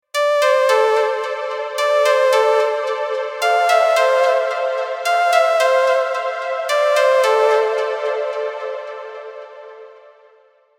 spacey ringtone

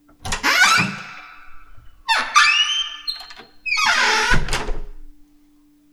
creaking door open and close

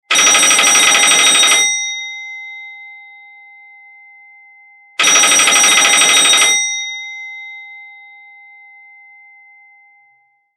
very old rotary phone ringing

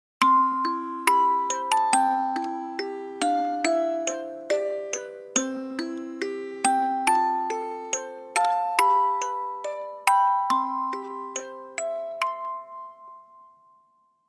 christmas music box

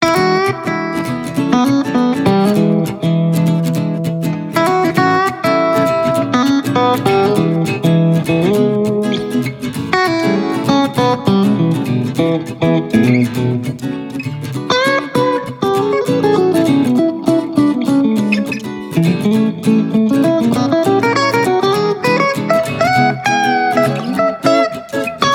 country guitar ringtone

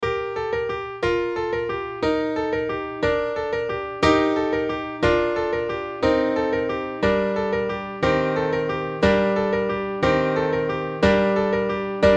christmas piano instrumental ringtone

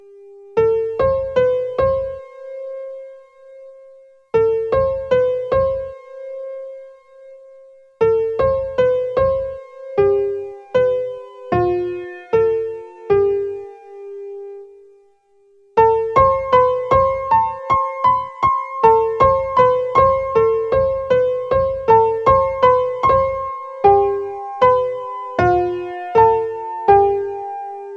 sad piano

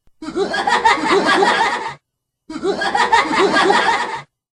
laugh; sweet tooth

